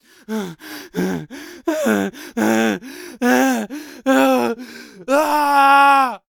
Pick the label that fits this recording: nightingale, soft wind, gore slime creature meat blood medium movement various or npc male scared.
npc male scared